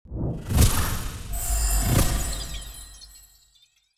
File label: Healing Boost; starbites; Makobo